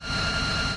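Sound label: metal grinding of a part from a machine tool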